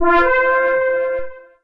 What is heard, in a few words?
Quest Continue; Live Realm